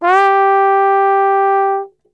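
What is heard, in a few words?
G 3 trombone glissando, Brass Super Section; Best Service; TRMB GLISS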